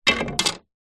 wood pine plank short thin 40mm x 10mm set down wood decking; foley